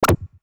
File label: futuristic button